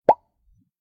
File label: lockpick fail; hijack